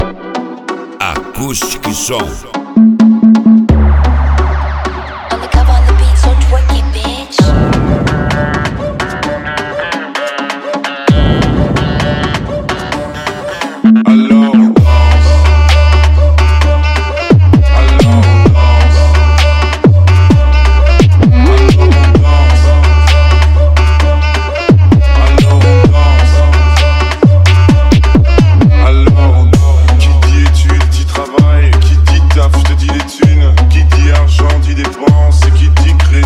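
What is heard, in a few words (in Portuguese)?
mega funk mix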